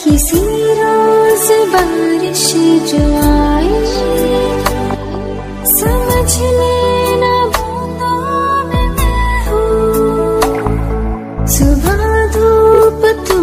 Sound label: hindi love ringtone, bollywood; bollywood ringtones